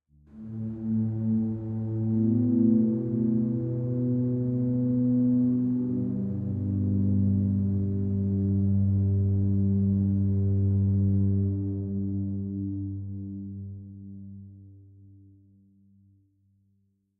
Organ Low; gamerip; that dragon cancer

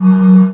Heal

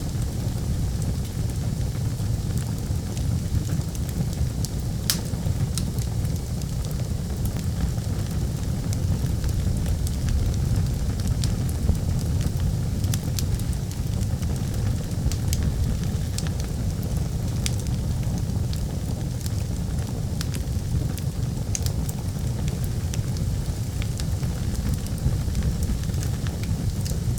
fireplace loop; devour

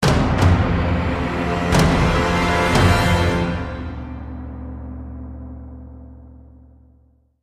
Combat Heavy Intro; Darksiders

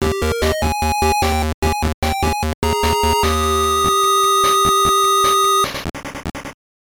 Unknown Jingle; madden 97; sgb

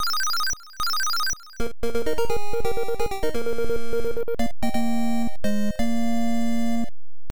Bee Whistle; family computer; ganbare goemon gaiden 2 tenka no zaiho

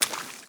walk water

